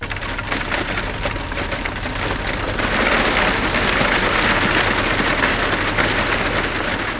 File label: vehicle; GRSITES Graphics